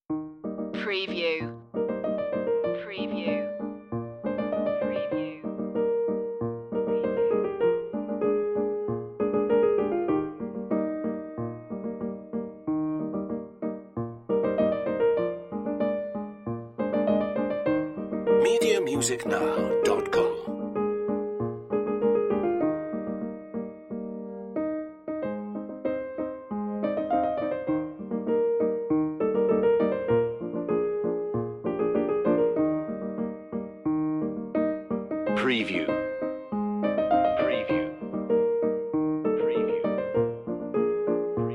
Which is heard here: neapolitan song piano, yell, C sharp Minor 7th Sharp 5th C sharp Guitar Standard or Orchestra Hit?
neapolitan song piano